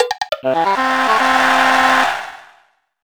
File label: In Hole; Minigolf